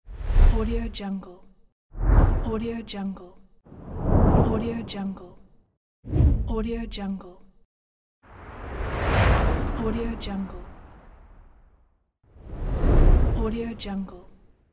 swoosh transition

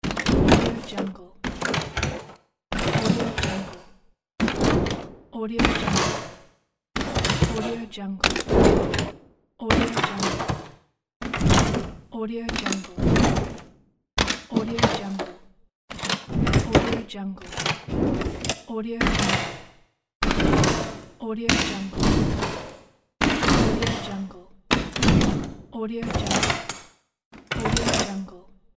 chest

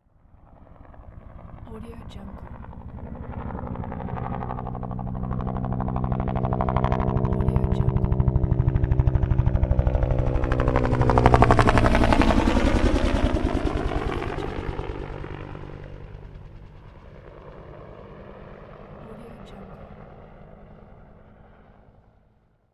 helicopter

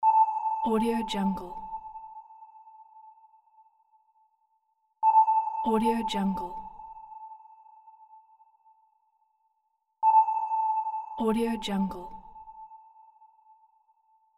submarine sonar radar beep

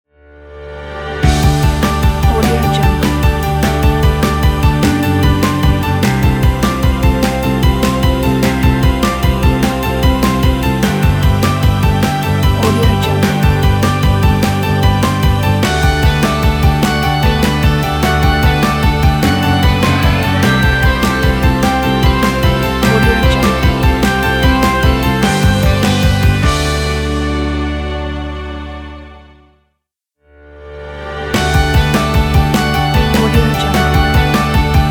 news ident

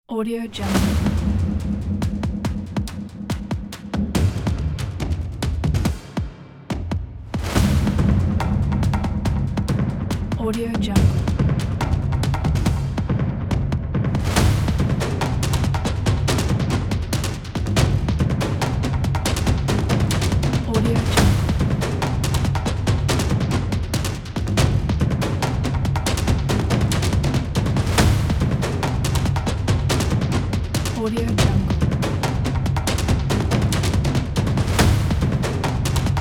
action industrial beat percussions

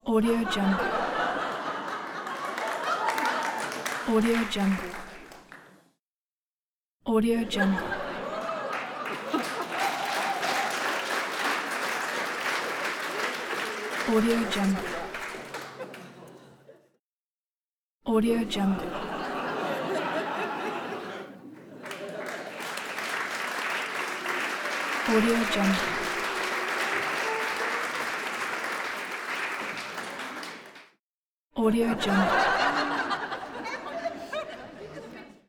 audience crowd laughing

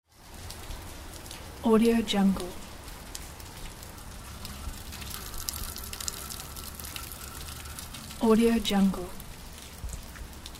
noise of rain in the city ambiance

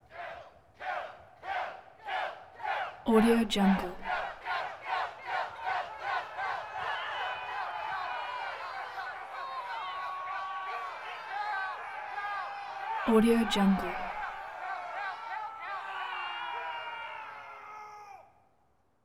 int battle chant kill kill

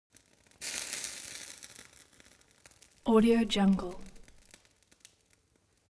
elements fire torch sizzle phase; smartsoundfx

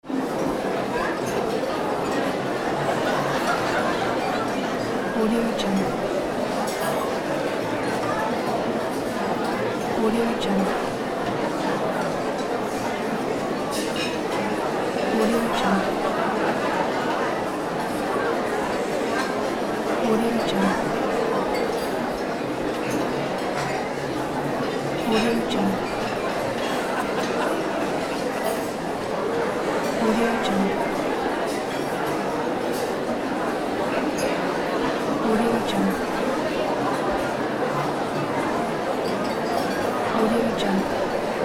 bar ambience

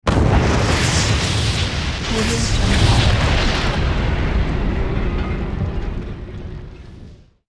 explosion multiple; hollywoodedge

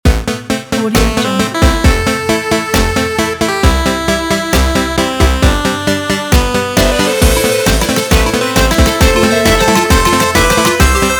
8 bit epic logo

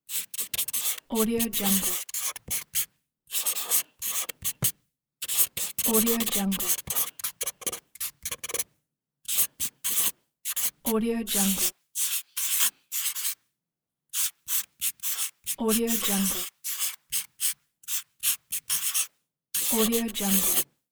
marker writing; fascinatedsound

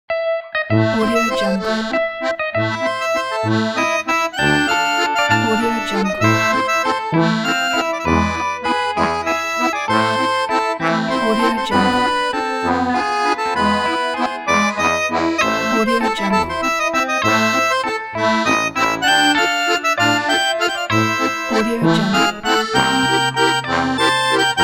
60s memories

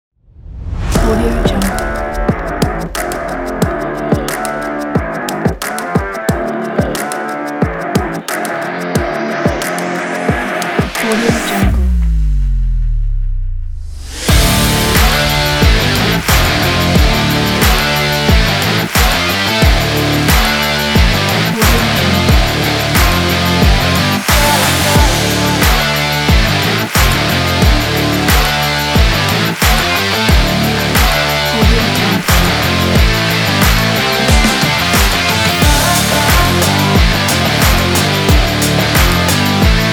stilish rock and trailer epic

